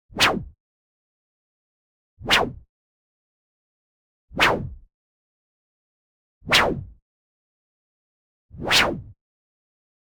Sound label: WHOOSH White Noise Short; boom library; Anime Essentials